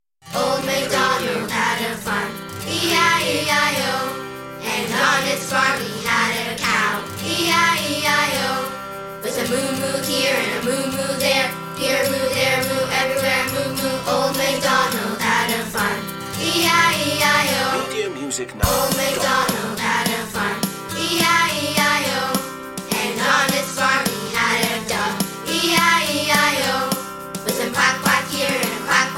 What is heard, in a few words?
old macdonald had a farm children group vocal no sound-fx